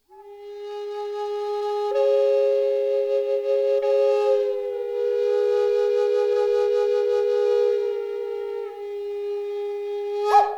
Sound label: G Drone Kauri